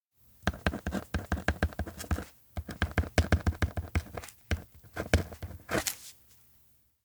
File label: roster write name; koyomi; anemoi